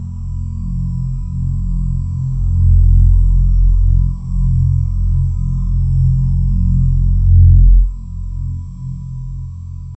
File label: low sustained dark ambien